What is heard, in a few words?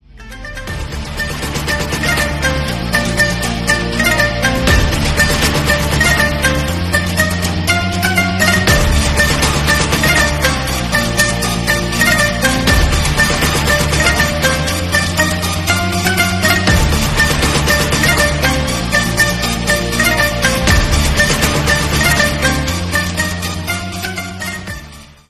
Monster Bgm Ringtone; KGF 2